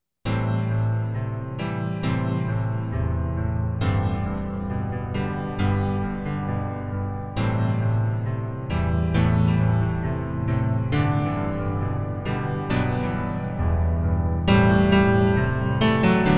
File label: piano